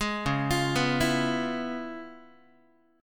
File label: C sharp 7th Sharp 9th Guitar, chord; arpeggiated; C#7#9; JGuitar